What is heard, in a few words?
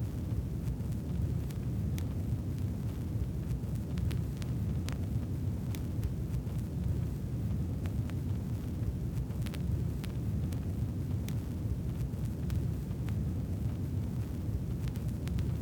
Fake 33 rpm